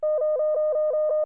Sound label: Sickbay Telemetry; trek